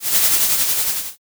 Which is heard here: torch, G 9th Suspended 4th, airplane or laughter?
torch